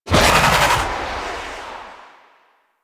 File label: Fairy Soul Cast; DE; Warframe